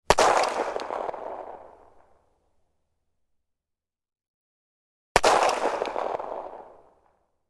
gun 1911; weapon; still north media

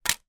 household dvd discs drop on table

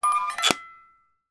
household music box lid shut whilst music playing

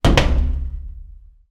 industrial door metal close; zapsplat

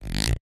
industrial ratchet plastic turn clicks

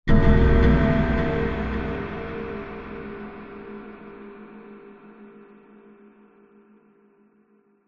horror stab dark scary cinematic

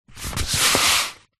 paper sheet a4 push by hand on table; foley

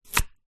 food knife slice cut carrot

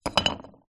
molded plaster object small down on table

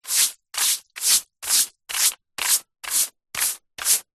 household sweep wet ground; zapsplat

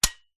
office staple gun dry fire

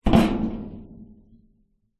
metal oil drum half roll over; foley

